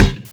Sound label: ball hit; HeavenStudio; space Soccer